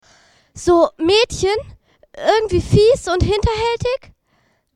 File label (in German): Mädchen Jingle